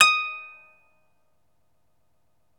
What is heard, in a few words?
CELTIC HA, Celtic Harp